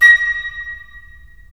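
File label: FL, flute; LA Composer vol. 3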